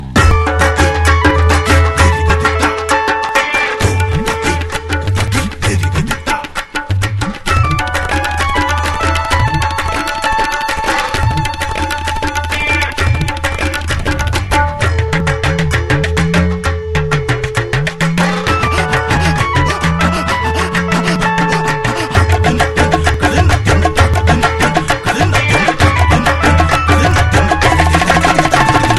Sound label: Nokia Festive